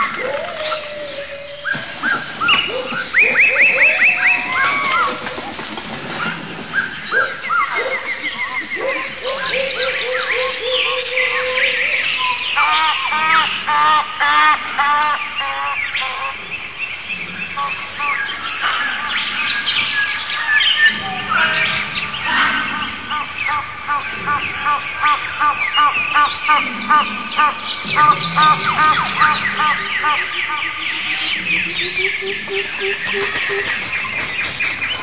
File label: Nature Loop Congo